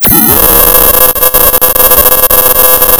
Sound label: Sound of tearing paper, center